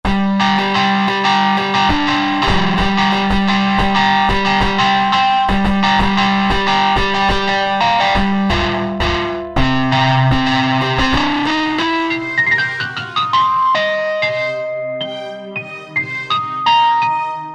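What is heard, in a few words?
Radio Symphony; RadioSymphny; Roland SR-JV80-12 Hip Hop Collection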